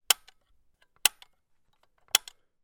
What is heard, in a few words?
gun mossberg; still north media